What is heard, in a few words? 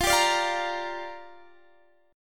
G Minor 7th, F